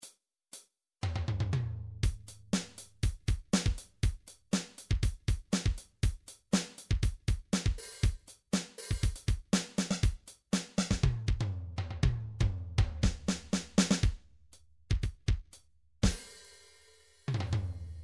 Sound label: midi drums, funk